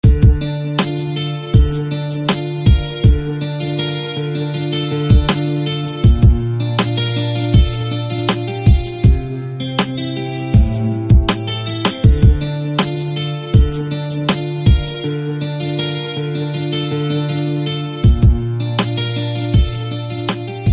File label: Softpick Hand; SFB FX